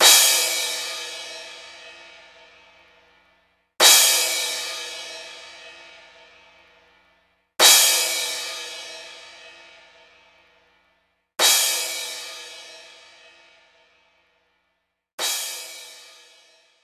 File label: Crash Cymbal; knights try